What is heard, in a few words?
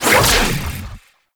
Archon Whip Whoosh; Warframe; The New War; Nira